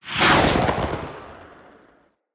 Blind Arrow Whiz By; Powers; Fey; Saboteur

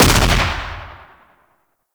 3D Fire, Weapons; Hek

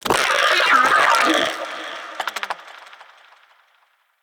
Shrimp Lantern Vocal Death; Creatures; Baliq

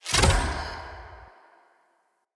Decoy Bow Shot; Powers; Fey; Saboteur

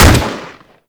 Rifle Shot; Warframe; Tenno; Tenno Drake Rifle

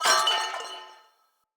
Corrupted Battle Dog Bell Swing Short; Foley

